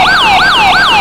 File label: yelp, sirens; soundoff nforce 400